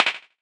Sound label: Clap